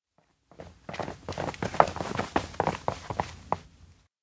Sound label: steps outdoor school walk three kids; anemoi